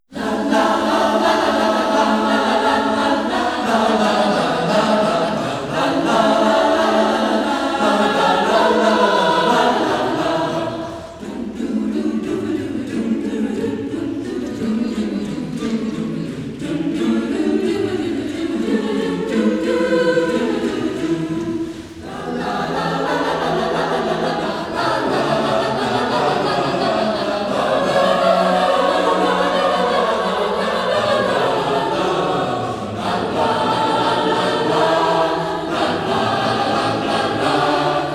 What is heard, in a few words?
Chamber Orchestra; High School Camerata; Bookline High School; Michael Driscoll director; Bourree; English Suite; J. S. Bach; arr. Ward Swingle; Spring Music Festival